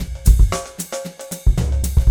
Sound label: 114 Latin Hip Samba, Fast Tempo; Pop Rock; Drums; Digidesign; Pro Tools; Pro Tools Essential Loops